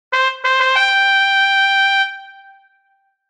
trompette royal

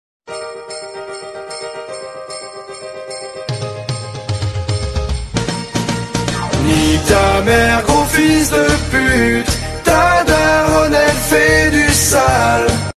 musique noel

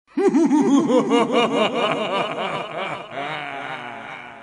rire demoniaque

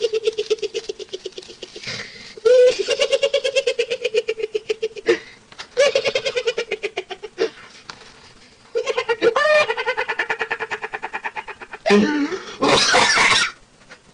chinois qui ris

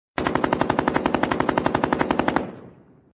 tir AK47

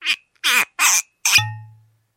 ouvrir bouteille liqueur

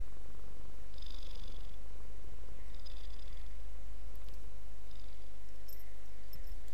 ronron chat

